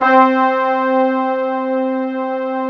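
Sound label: mellow section C4; MELLOWSCT; Synth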